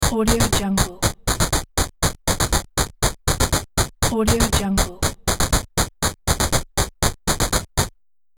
retro robot marching walk

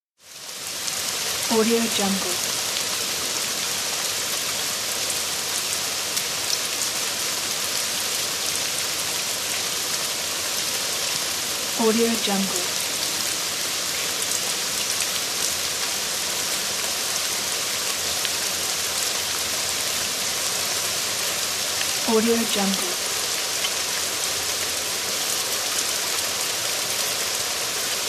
stereo rain; junglerobots

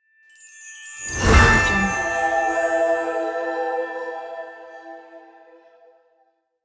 magic transformation

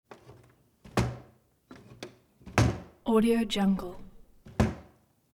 wood window open and close glass thud and slam; prosoundfx

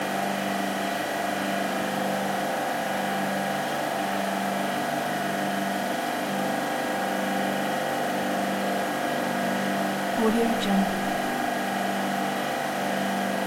machines industrial compressor ice rink loop

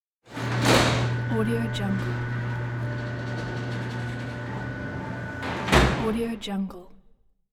industrial factory door

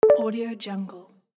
reminder notification

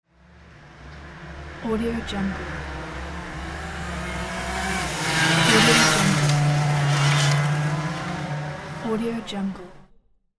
go kart drive by

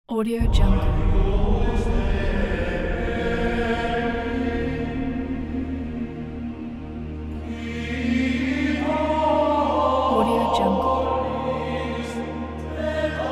gregorian chant; orchestralis

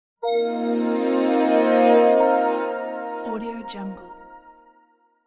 wellness bright logo

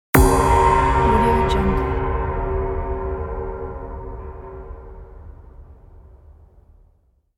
cinematic gong impact

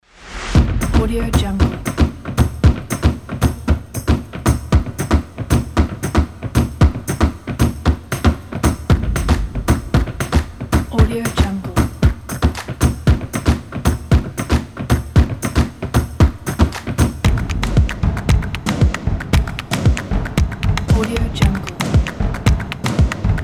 percussion; hitslab

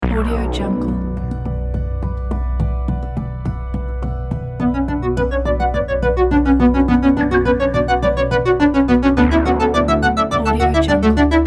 criminal 80; flaviocortizo

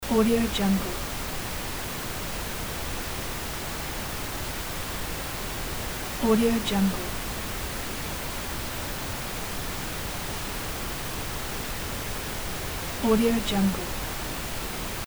pink noise

